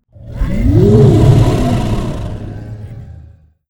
wraith death; Stellaris; guardians

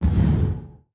distance flak weapon fire; weapons; Stellaris

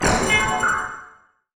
notification player lose xp; Stellaris; federations

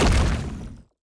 weapon chitin battery; Stellaris; grand archive; weapons

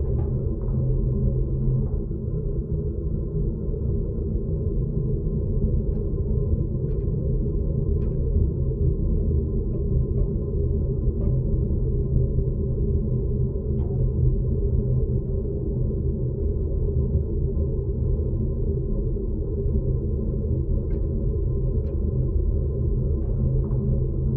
ambient wrecked shipyard idle; Stellaris

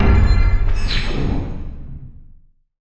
button senate floor propose law; Stellaris; federations

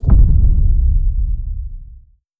neutron hit layer; apocalypse

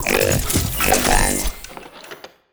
lithoid machine; lithoid portrait; Stellaris

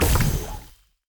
weapon barb batteries; Stellaris; grand archive; weapons

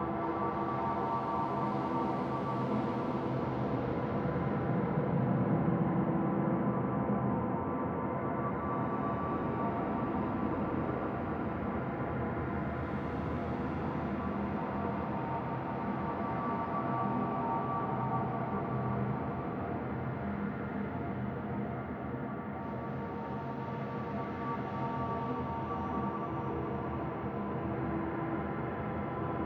space ambient; Stellaris

